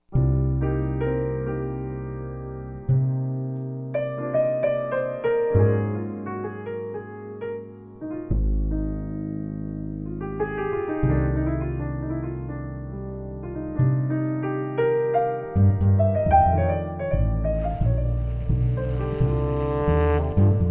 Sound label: jazz piano